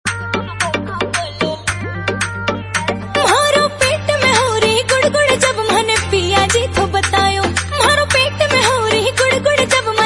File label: Haryanvi Romantic Song Ringtone